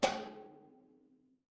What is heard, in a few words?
impact metal hit